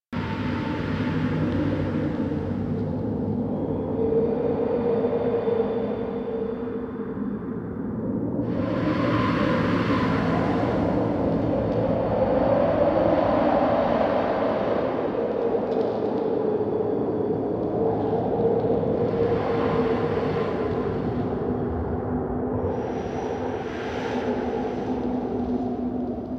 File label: Interior Background Amb Loop E, Ambience; Warframe; Duviri